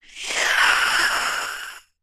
Monster breathes and hisses slowly. Alien, Breathe Growls, Monster Eerie Sound